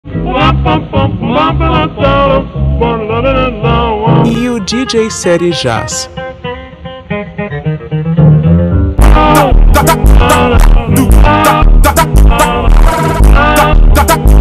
funk super slowed

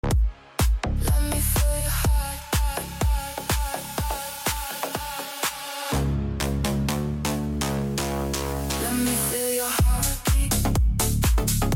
deep house remix

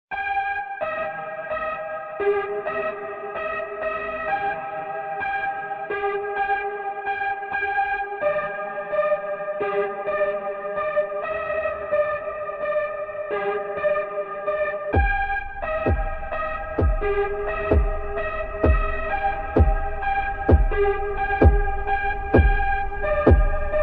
super slowed

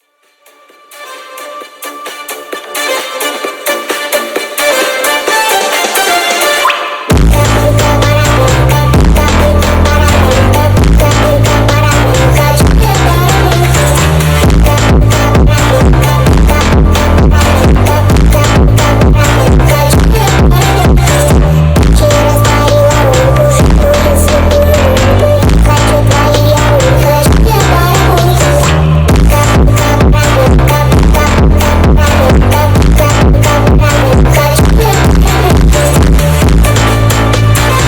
ultra phonk remix

